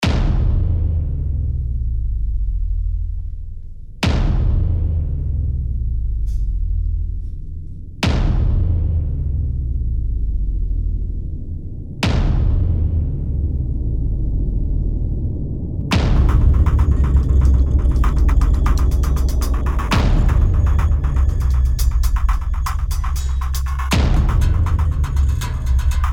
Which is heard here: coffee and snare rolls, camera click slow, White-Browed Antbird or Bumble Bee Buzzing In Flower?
coffee and snare rolls